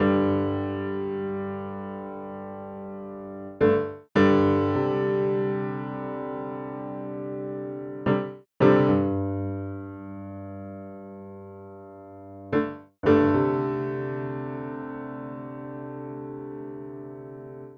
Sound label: Country Piano, Verse 1; Digidesign; Pro Tools Essential Loops